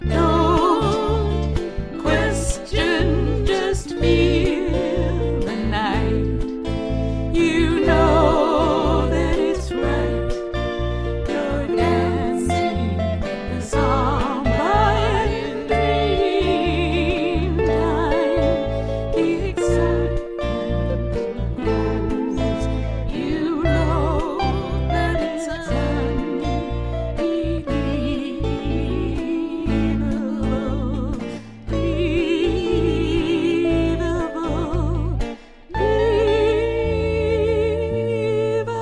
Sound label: Samba